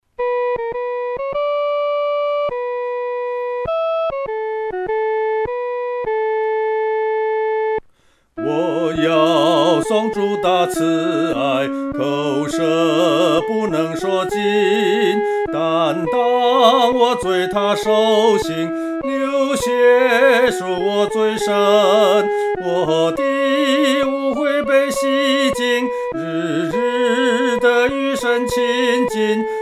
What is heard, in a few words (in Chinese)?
颂主大爱 独唱（第一声）